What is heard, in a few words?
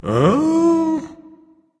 seriously berserker; cuchulainn